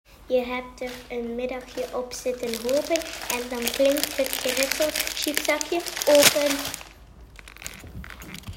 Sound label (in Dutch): chipszakje